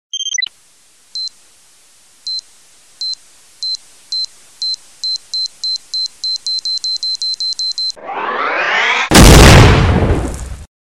3d bomb blast, sms; ringtones

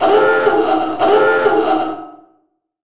SUBMARINE DIVE ALARM

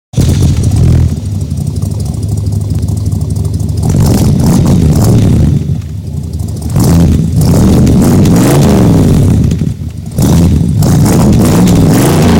Ford LTD 71

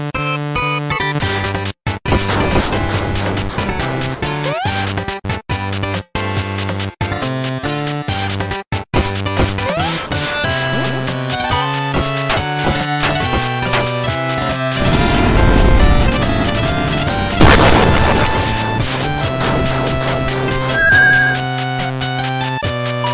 8 Bit

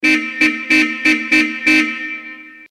car horn sound; ringtones; sms

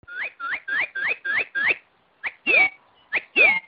cardinal singing; animals

